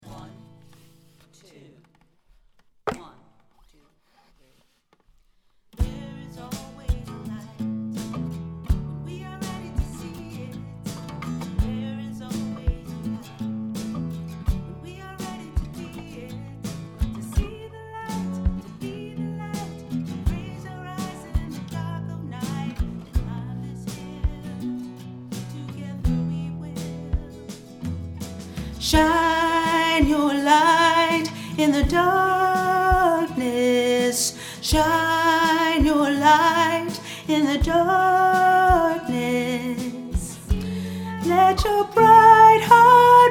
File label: SOPRANO, choir; freedom choir